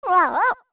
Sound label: Baby Goron Doze; Baby Goron dozing off